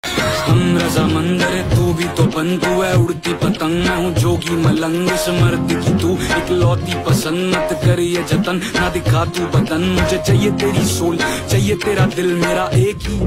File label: Ringtone